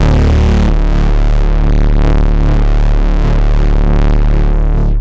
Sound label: Bass Long D; VEC1; Club; Long Basses